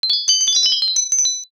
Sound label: sparkle long